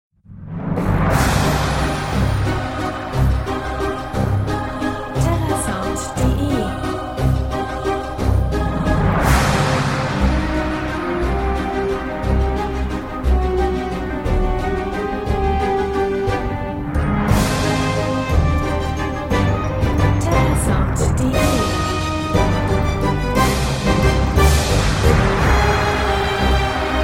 the witch waltz; terrasound

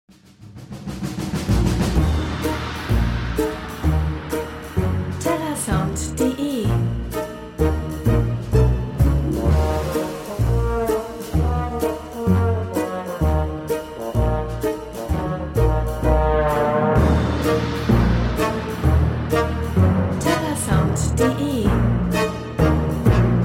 groove; terrasound

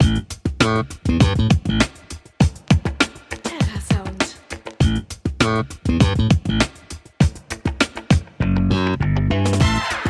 funk; terrasound

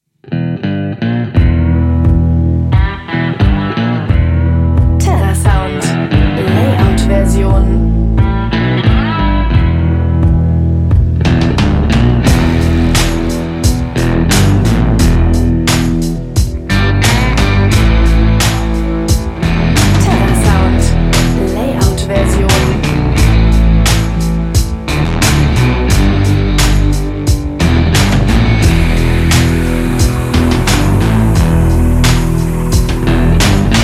blues machine; terrasound

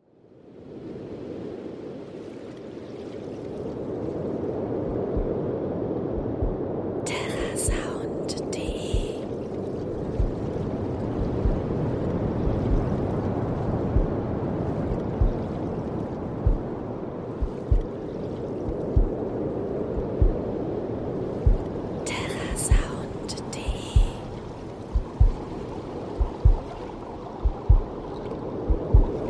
waves and heartbeat; terrasound

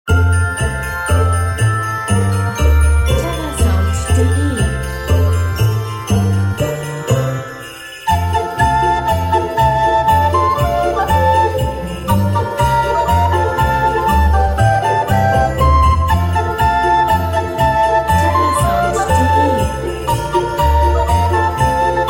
jingle bells; terrasound

